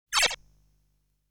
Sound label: Shot sound; Legend of Hero Tonma